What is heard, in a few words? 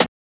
Snare, trap drum kit